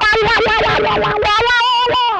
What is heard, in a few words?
SITTING WH, Funk Guitar; 90 s Sample CDs; Zero G